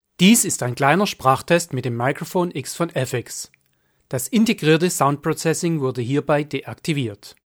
Aphex neutral

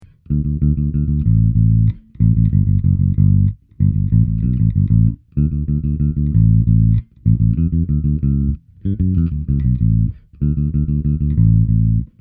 reggae

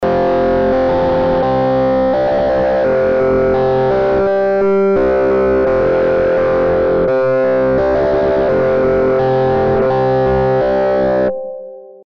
Arturia Stage 73 V2 Dist OPAMP 21 Rhodes To Mush

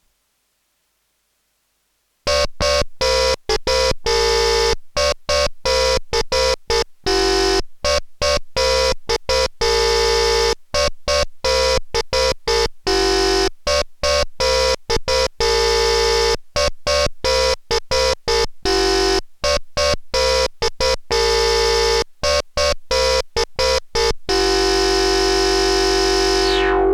Korg Monotron Duo

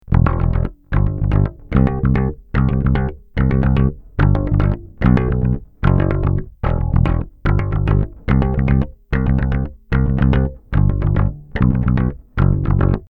Bass Pre 73 DI

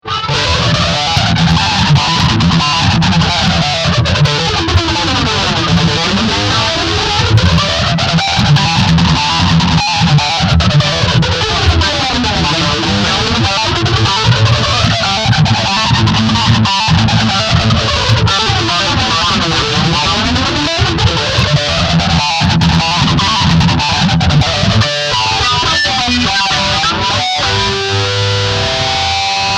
Flange Heavy Slow

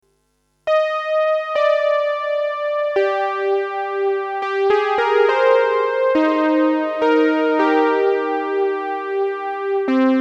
Boss CE-300

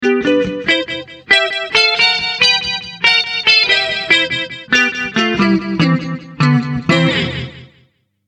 Boss ME-70 Clean

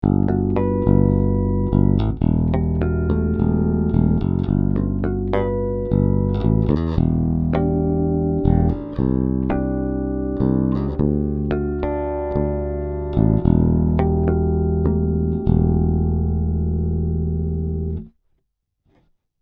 Savannah Asat Bass Harmonics

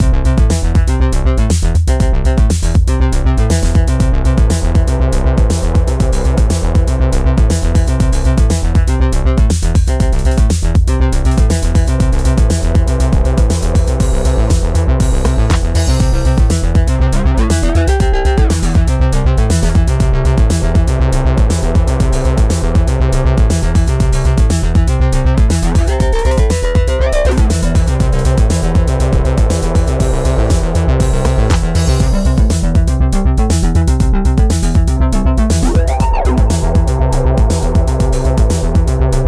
Moogerfooger Synth; Amazona Moogerfooger Workshop

